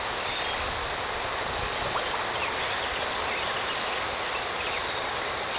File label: Swamp